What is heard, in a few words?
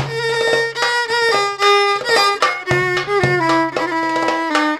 100 PRC VIO; USB Soundscan vol.43 - ArabianTraditions; AKAI